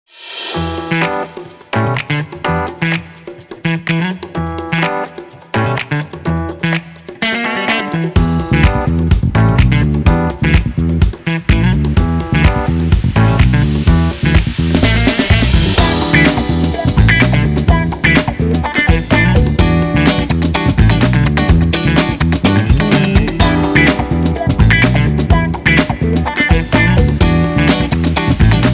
A Funky (extra-short)

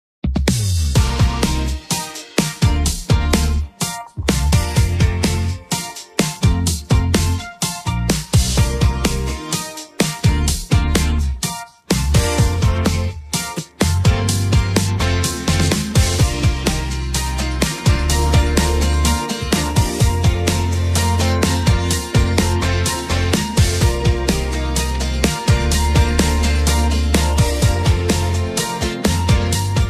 Instrumental

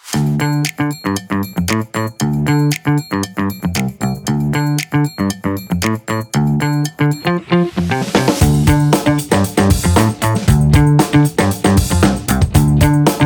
Funk

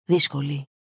Hard Breathing; Greek

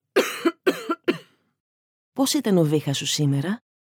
cough; Greek

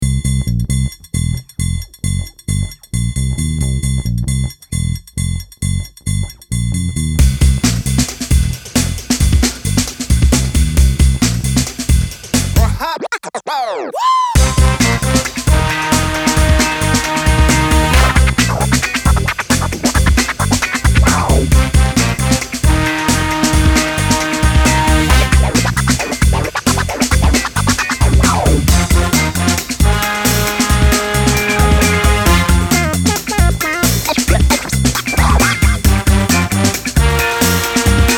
Funky Energy Breaks

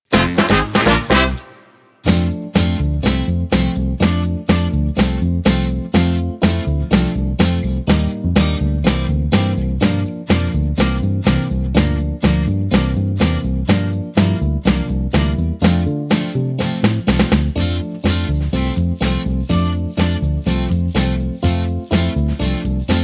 Backing Track

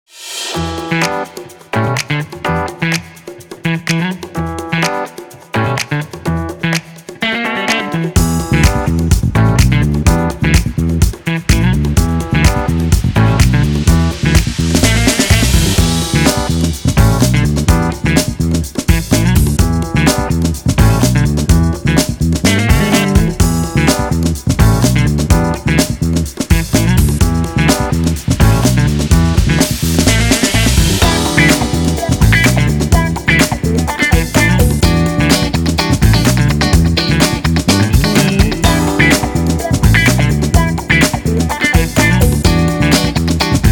Funky

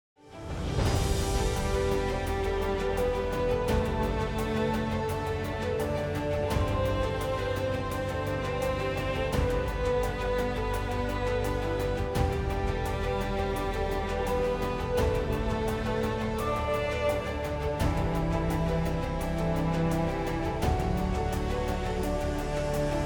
Walk Up Music

